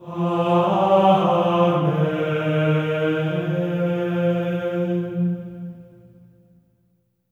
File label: AMEN F4, Symphony of Voices; Spectrasonics; GR AMEN